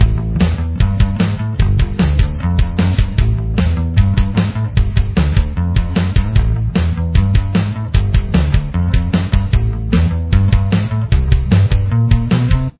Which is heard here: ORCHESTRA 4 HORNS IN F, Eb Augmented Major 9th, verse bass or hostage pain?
verse bass